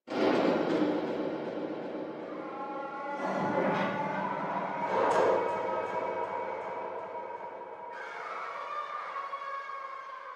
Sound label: Boss mission background sounds